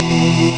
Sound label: arrr; ST-XX Amiga Soundtracker Sample Packs